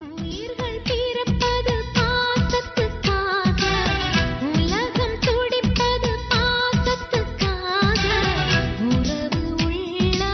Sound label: tamil serial song; annamalai